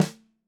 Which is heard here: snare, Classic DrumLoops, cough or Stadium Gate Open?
snare